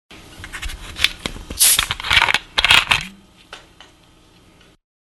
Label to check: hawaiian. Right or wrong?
Wrong